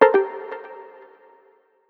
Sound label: dev error; Fennix; Dummy audio